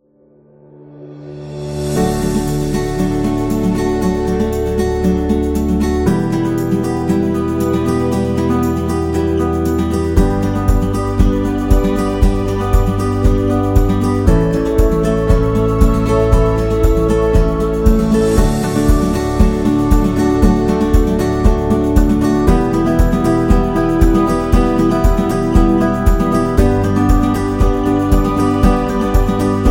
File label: Emotional Guitar and Piano